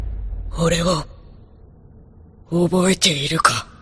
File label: Anime Notification; MIUI12